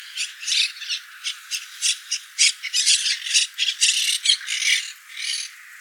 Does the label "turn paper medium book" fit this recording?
No